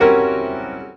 Piano Chord

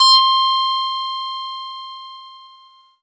SQ80 PLINK, Synthesizers; Soli-Music; World of Synthesizers VOL-1

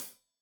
Hat Tight, Hi-Hat, Cymbals; Deeply Sampled Drums

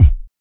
BOOGA BRETH, Vocal Planet Blues & Soul; ILIO

